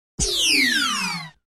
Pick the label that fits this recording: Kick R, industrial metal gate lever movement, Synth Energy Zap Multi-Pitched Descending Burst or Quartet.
Synth Energy Zap Multi-Pitched Descending Burst